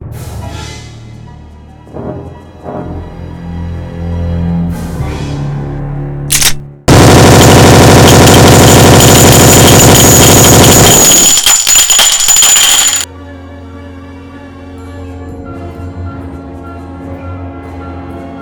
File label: Thompson 1921